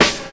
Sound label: raw steel snare drum sound g key; snare drum samples